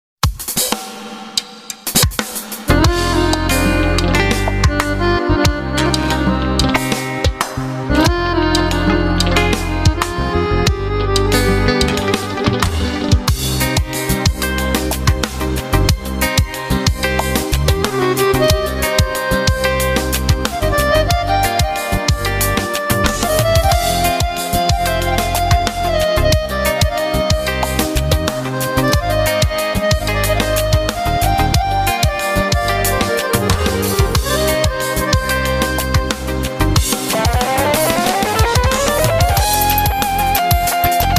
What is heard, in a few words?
Instrumental